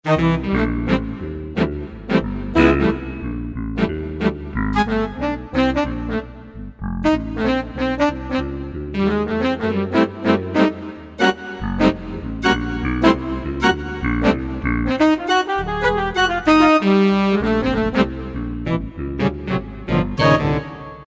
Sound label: Big Band, Korg T3